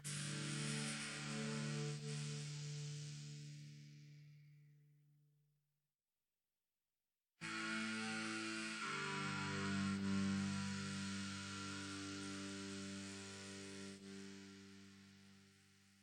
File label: Synth